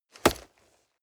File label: nylon leather hit hard